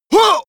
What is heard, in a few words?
male attack, schreie